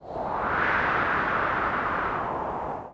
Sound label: nature wind; theodyssey